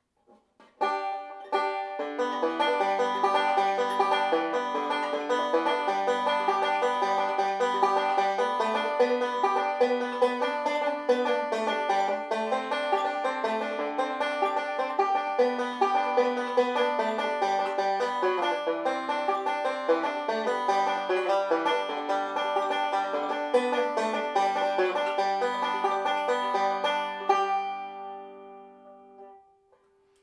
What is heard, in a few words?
goldtone, banjo